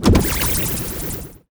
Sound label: Slow step; Pc skill; FlyForInsanity